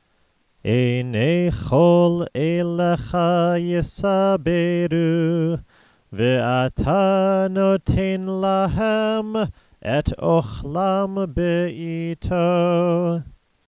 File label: ashrei